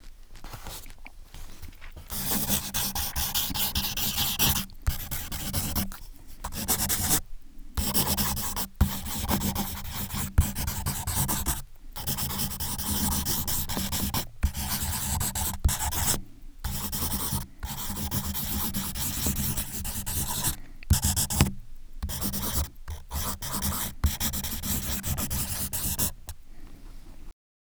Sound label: writing